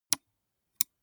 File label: gaming mouse left click single slow soft; maschinen; buero